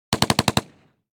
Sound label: gun machine gun; NCH Library